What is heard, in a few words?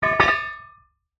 industrial manhole cover metal open